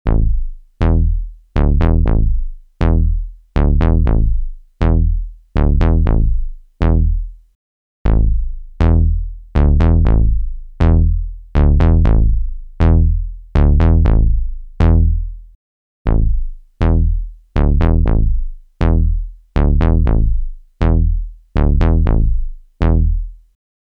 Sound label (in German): Novation Summit Analog Bass Vergleich